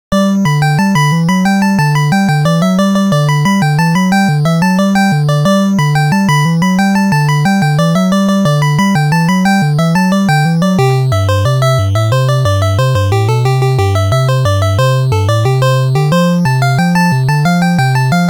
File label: Victory; Solitaire; NES